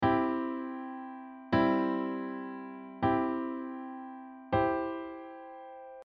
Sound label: inv exercise, accompaniment inversion